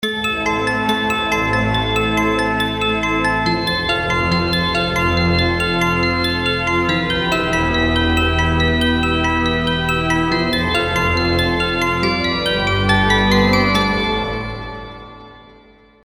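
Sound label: M1 Cloud Nine, synthesizer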